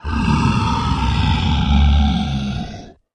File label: Growl, ghosts & demons